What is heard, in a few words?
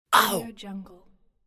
short pain shout